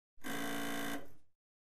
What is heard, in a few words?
electric door bell